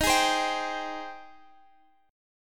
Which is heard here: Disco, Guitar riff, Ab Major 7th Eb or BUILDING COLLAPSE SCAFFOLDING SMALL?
Ab Major 7th Eb